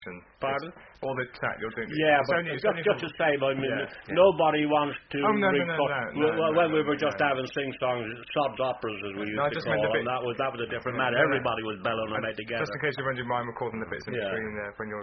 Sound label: Bugle Calls, Singer, Male